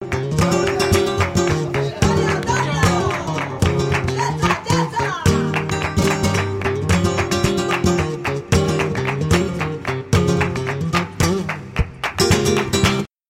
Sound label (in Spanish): buleria, flamenco